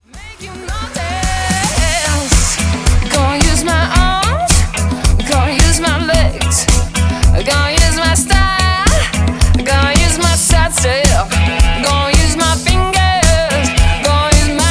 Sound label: 110 bpm, A